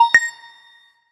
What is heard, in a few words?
correct